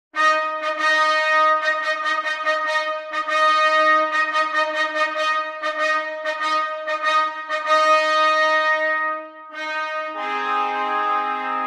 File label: Six Classical Trumpet Quartets